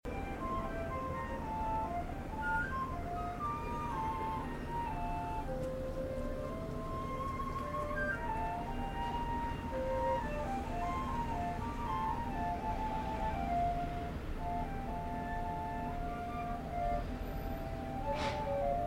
recorder practice heard through an open window from the street